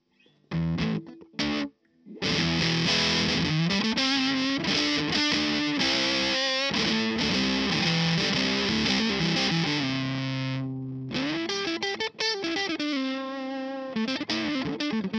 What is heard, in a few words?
Strat Twisted